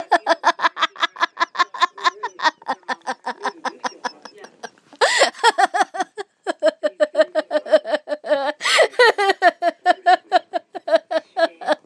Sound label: laugh